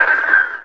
shotgun; Player SE

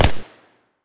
smg, fire; weapons

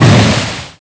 shot

